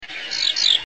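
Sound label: House Wren; House Wren (Troglodytes aedon); A rapid churring note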